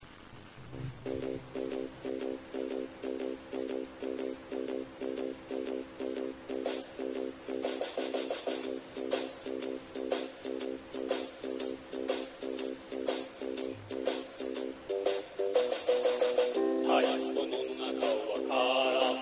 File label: taiko